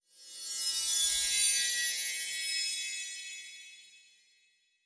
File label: Mirror